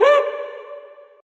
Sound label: woo; Voice; ooh ahh